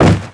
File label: body impact medium